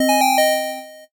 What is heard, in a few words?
8bit se